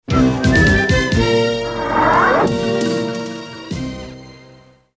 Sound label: music bumper